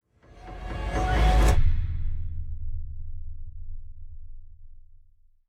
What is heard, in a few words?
shock reverse; Joe's Diner